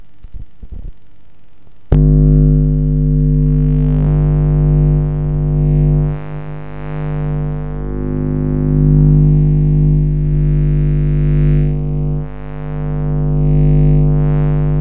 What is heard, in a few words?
microwave, telephone pickup